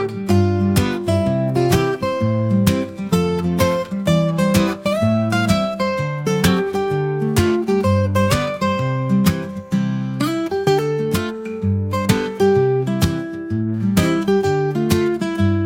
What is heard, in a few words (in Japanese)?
アコースティックギター ほのぼの; YouTube用